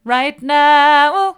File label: right now mid, vocal